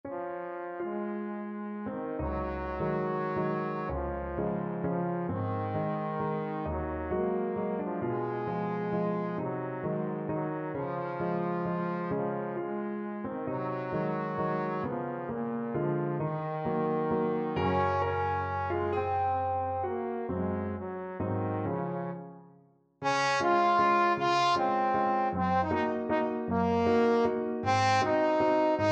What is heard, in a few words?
waltz, trombone